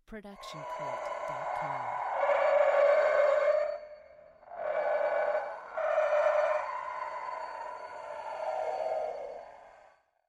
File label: Distorted Haunting Voices; SoundsCrate